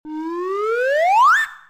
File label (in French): Sons cartoon ascendant; bruitage